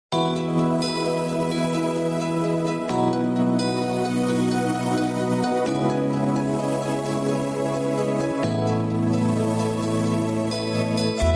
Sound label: Vocal Removed HD Karaok